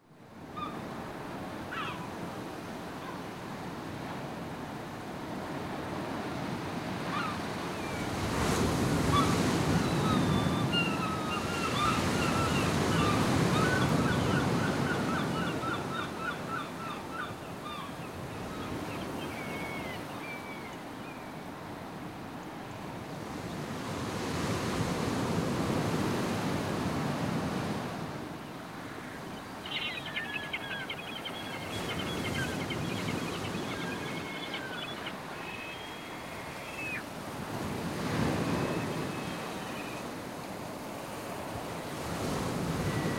ambience emerald beach; FantasyTennis

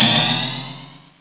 Whoosh; 3D Ultra Pinball Demo

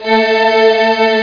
choir strings

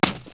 splats

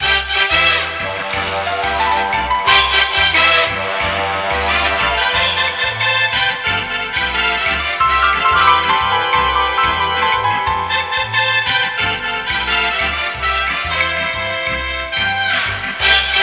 latin sound loop; nissan; interior

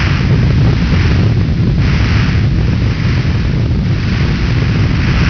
rumble

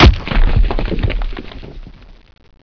stone fall; wob2 demo

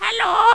Parrot Hello

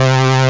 cyber analog stri

